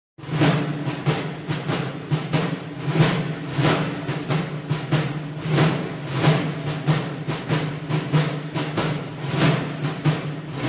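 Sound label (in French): pas redoublé de la garde impériale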